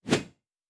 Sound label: Whoosh; Fantasy Sounds Bundle